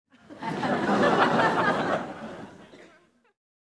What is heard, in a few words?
large crowd laugh; Asian Show Sound Effects